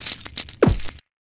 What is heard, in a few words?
Plunder Victory Overlay